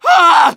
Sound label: medic pain severe; tf2